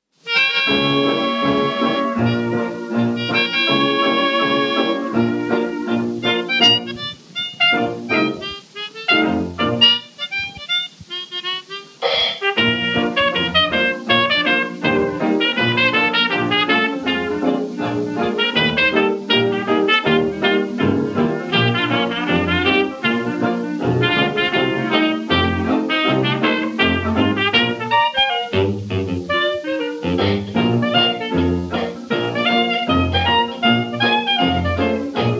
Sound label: Swamp Blues